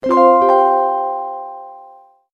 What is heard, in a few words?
New Call